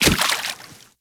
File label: Step Water, Footsteps; Warframe; Kubrow